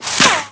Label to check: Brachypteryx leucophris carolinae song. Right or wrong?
Wrong